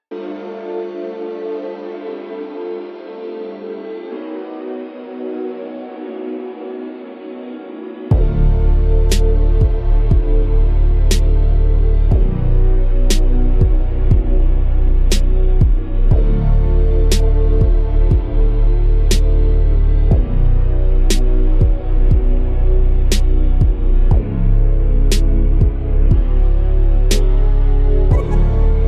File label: Trash Music Dark Ambience; Some Dope Beats 2